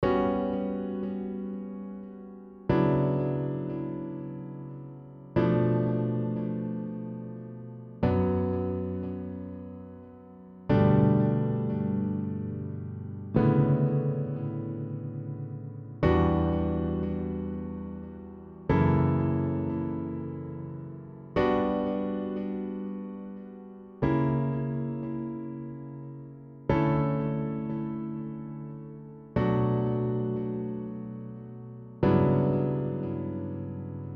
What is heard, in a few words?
Retro Groove